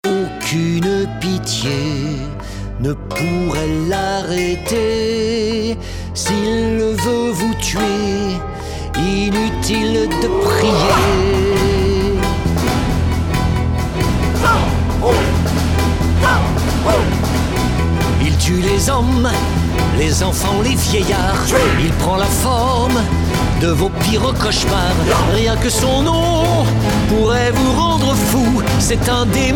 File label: Le varou Sop